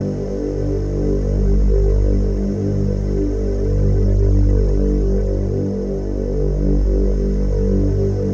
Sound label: 115 G Drone Suspended; Zero-G - Future Wave